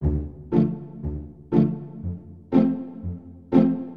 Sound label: sound machine sample; hof furni